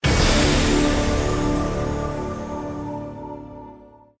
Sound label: Battle Stinger Wave Start; Zak Storm Super Pirate; mobile